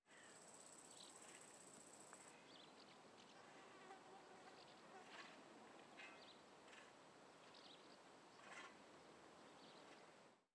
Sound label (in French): Pépillement Grillon Pelle, Friche Industrielle Rhinau